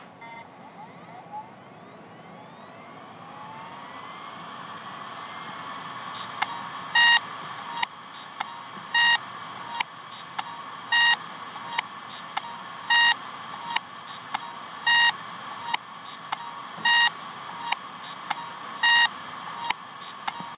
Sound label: Torn Heads